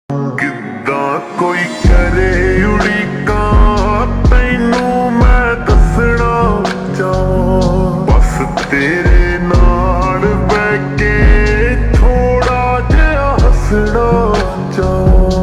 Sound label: Ringtone slowed and reverb